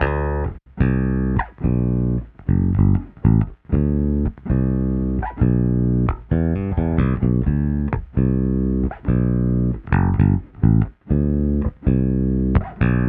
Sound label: Bass